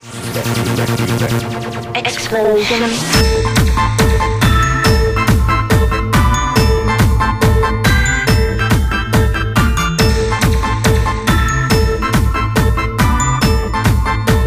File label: tom snare waterfalls